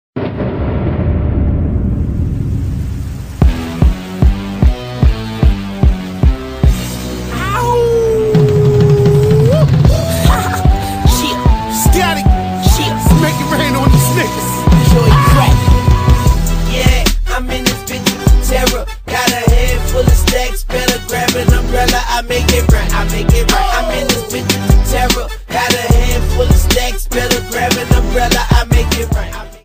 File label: THEME; RG3